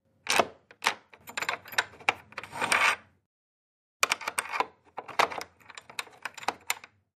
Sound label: Keys, Lock, Unlock A Wood Door Plus Stick x2